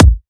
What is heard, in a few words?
Riddim Kick